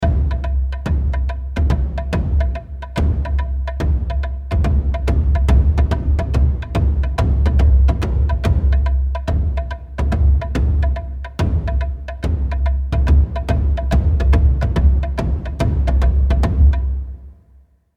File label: Taiko Percussion; Scott Van